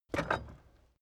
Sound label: carriage hatch single metal